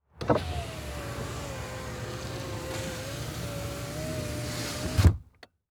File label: Transportation Chevy Trailblazer Close Window Interior